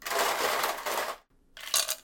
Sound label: ice into glass; eating